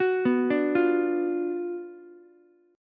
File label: Gb Major 7th Suspended 4th Sharp 5th, Ukulele, GbM7sus4#5 chord; arpeggiated; 11,11,10,8; JGuitar